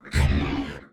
Damage

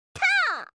FEMALE attack

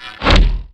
bow; Weapon

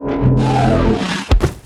Lava die

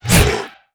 ATTACK

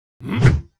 attack; paimon

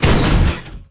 Guard Damage; Mob

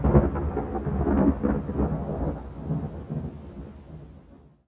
Thunder, ambience

